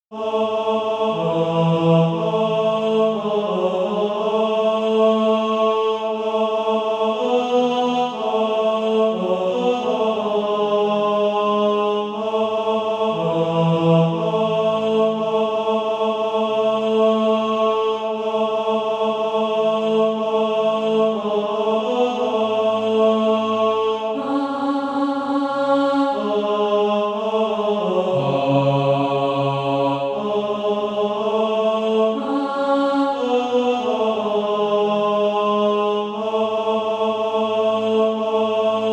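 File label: ten, Practise Music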